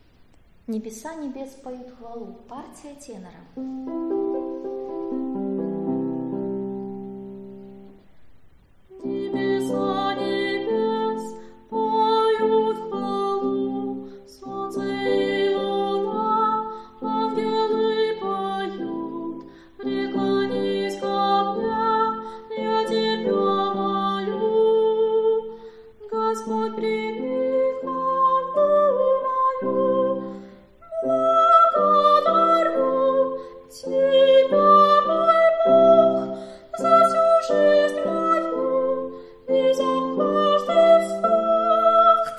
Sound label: tenor; Nebesa nebes